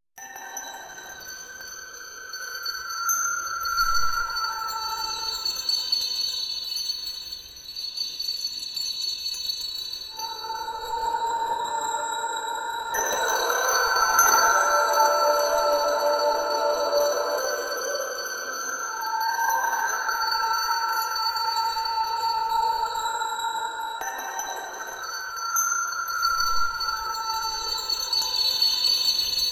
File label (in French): ambiance onirique rappela, dream